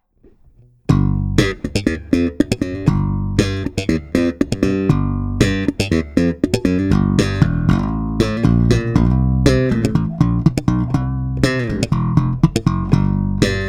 SLAP DIRECT

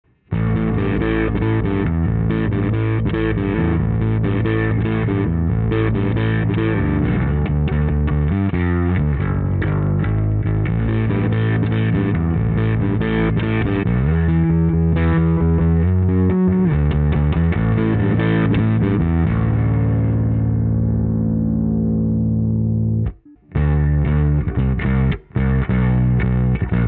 bass max dist; STM900; svt7 pro; streamliner 900

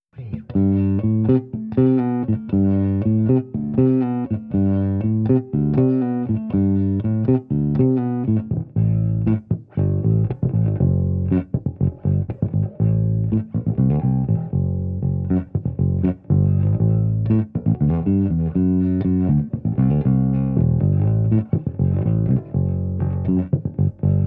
bass

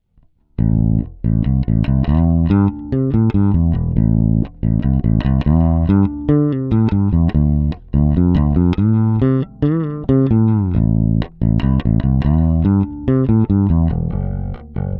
fretless semi hollow

